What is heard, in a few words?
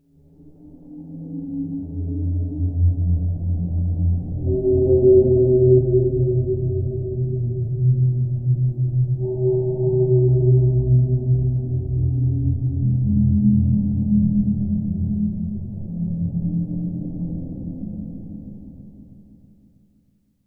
Monster Howling; Cloverfield